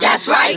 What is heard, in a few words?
that's right group of women SUNG